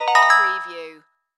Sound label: Multimedia Alert